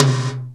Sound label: TOM, DrumBase5000; Crypton; CFZ KIT 2